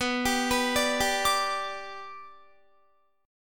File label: B Minor Sharp 5th; arpeggiated; Guitar